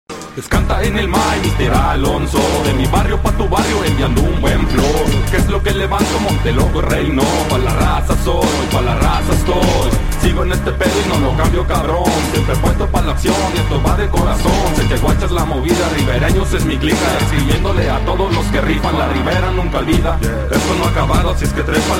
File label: spanish rap ringtone